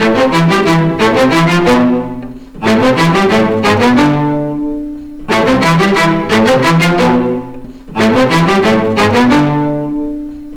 Orchestra Strings fuzz

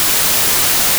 white noise

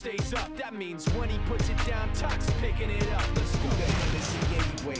music 16kHz

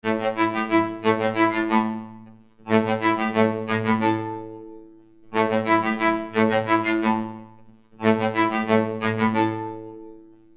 Orchestra Strings robot

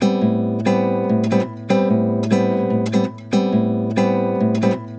music 16kHz

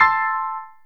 Amin5; MIRtoolbox1.3.2; MIRToolboxDemos

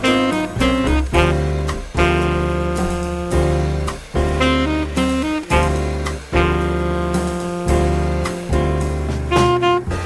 jazz

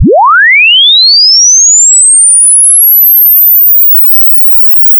sweep

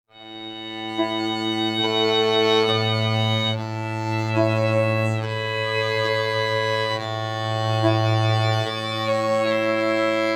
Orchestra Strings robot

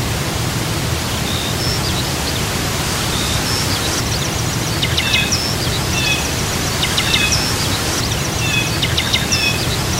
scene

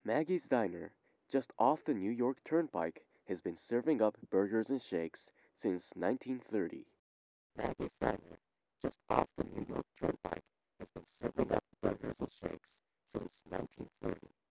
high frequency bands spectral smearing

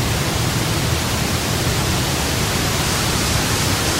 forest, background; simscene-py

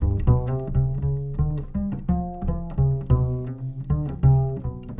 music 16kHz

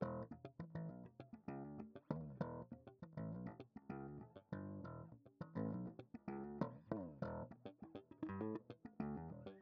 Electric Slap bandpass

